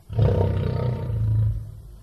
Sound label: lion; Cirque